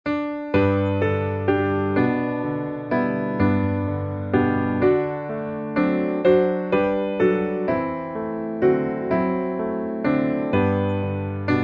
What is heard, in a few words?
piano